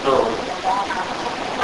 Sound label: female